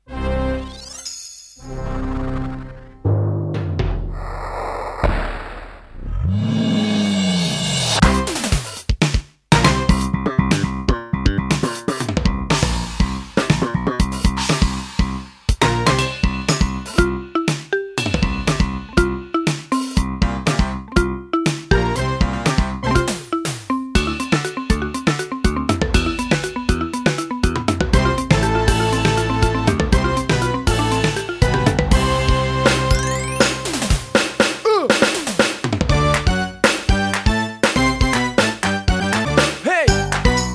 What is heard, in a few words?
Yamaha RX5